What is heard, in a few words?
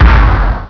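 electric; HIGHTECH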